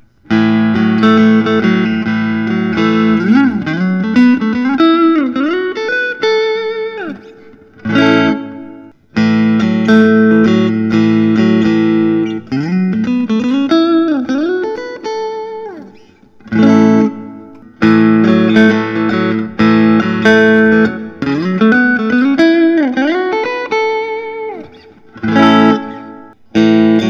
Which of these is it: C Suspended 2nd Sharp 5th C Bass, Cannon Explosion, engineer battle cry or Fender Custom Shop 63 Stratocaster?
Fender Custom Shop 63 Stratocaster